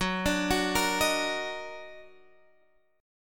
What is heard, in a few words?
D sharp Minor Major 7th F sharp, Guitar